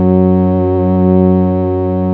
PAD ZEN Pad; Best Service Gigapack 1

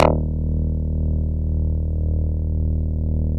SNARL D#1, BASSES

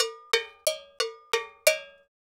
Cow Bell, Latin Percussion

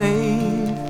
Vox; Solilla; Akai MPC5000; Internal Rom 2

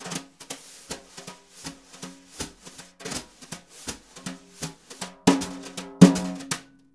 BRU, BRUSH; Univers Sons; Jazzistic

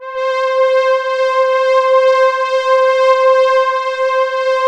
ST S STR C5, SYNTHS, House Side Of 2 Step; USB Soundscan vol.51; AKAI

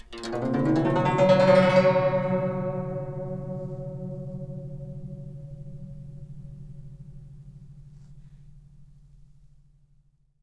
GUCH GLS, GUCHIN; INIS - Opium; GUCHIN-GLS

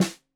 Snare 65 Reg Stereo, Deeply Sampled Drums

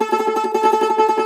Cumbus SAZ A, Plucked Strings; E-MU Producer Series Vol. 7 – Old World Instruments

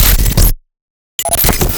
glitch

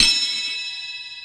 Cree Bell; KJ Sawka; Drum n Bass & Beyond; Drum Tool Box

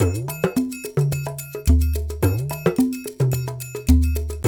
108 AFRO, African Contemporary, Afro Triangle; Spectrasonics; Supreme Beats

